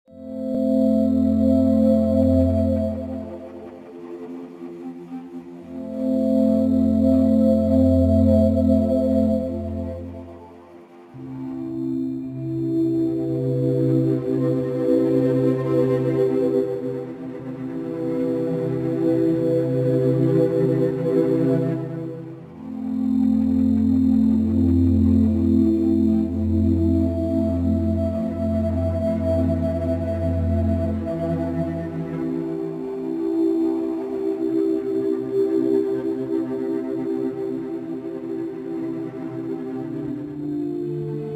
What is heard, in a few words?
emotional reflection